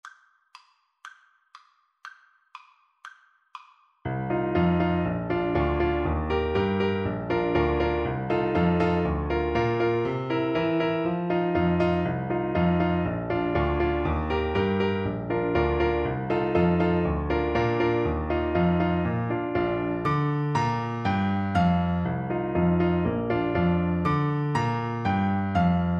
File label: Oboe